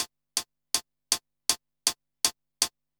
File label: hihat